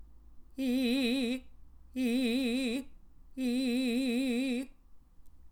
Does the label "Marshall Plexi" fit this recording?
No